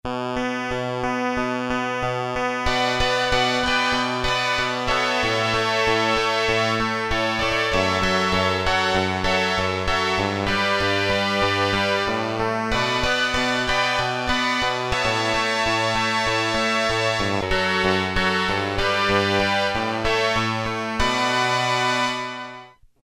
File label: Synth Ens, Casio MT-600; Tones